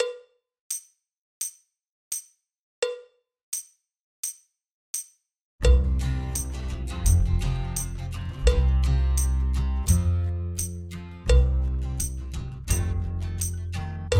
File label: Drum Kit Reel Country Playalong; Pre-Grade Higher; backing tracks; MTB Exams; MTB Drums Pre-Grade Higher Handbook